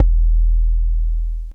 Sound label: BD, Dance Mega Drums, HIP